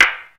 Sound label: Percussion, House Drum One-Shots